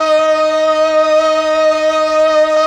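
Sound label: str d sharp 4, String, MELLOTRON; Retro Sampler; Choir + String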